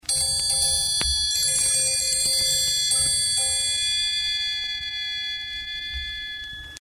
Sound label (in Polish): Trójkąt